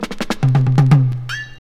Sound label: Fills; AMG; Now CD-ROM (Roland)